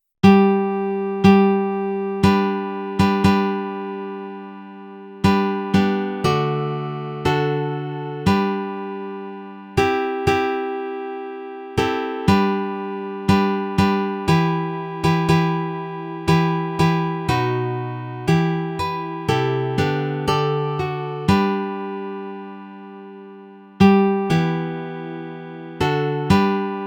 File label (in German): G-Dur Nylon Guitar